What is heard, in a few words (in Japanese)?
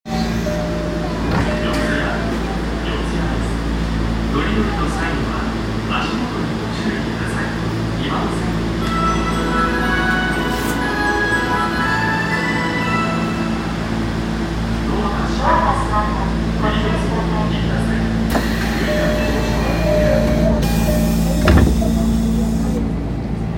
丸ノ内線四ツ谷東, train sounds